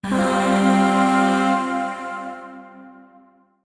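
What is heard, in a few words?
Void Shawzin Pentatonic Minor Chord; Warframe; Zariman; Chords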